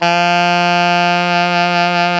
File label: SAX ALTO FF; SAXOPHONES; Roland - LA Composer vol. 1